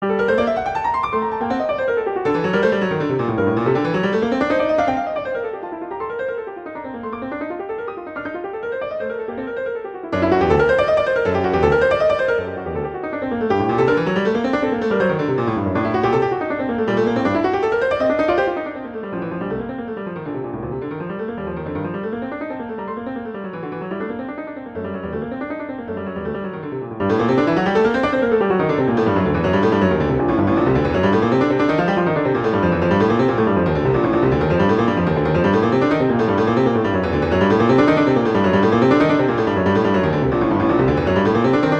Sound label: Exercise - G minor; Clementi; Preludes